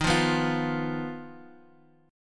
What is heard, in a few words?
Eb Suspended 2nd Sharp 5th; Ebsus2#5 strummed; JGuitar